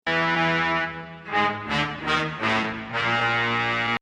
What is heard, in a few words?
Plasma Leak